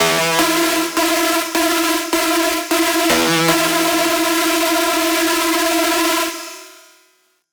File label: screech loop E min 155; Music Loops; GhostHack